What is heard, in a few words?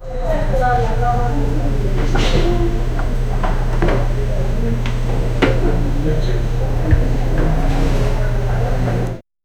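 warm cozy coffee shop ambience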